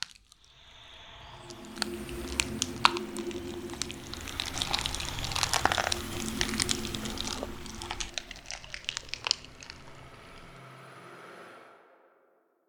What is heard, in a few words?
Acid Worm Grow; We Are the Dwarves